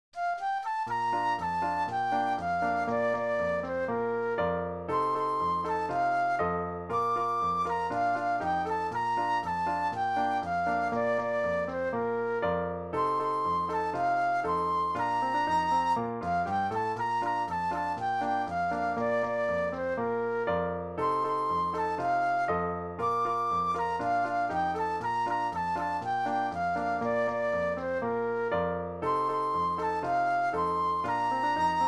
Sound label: Flute Solo; christian solos